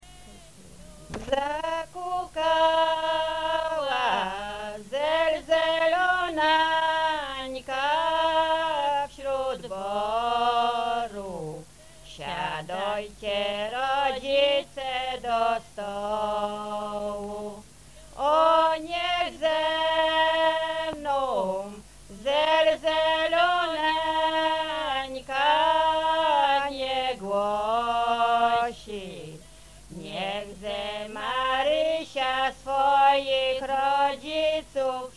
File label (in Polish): Przypisowka; Zakukala zelzelonanka